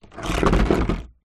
household plant pot large plastic drag on hard ground